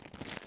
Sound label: plastic bag